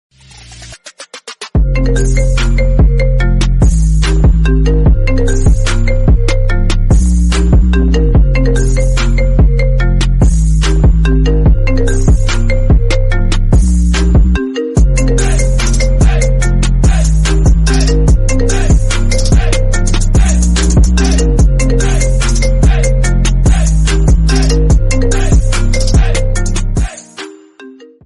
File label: iphone bass rington; iPhone 15 Pro Max